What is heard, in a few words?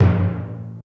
march tin